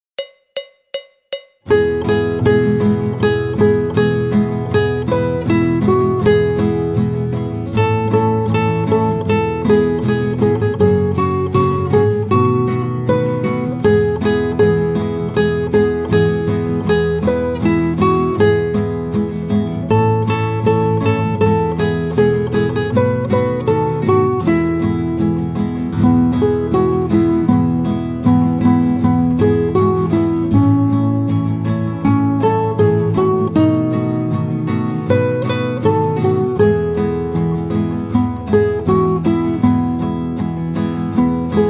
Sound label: jingle bells; Weihnachtsliederbuch